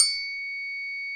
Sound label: triangle, Yamaha TG-500